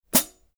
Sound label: 900 series 15 heavy hi-hat chick; paiste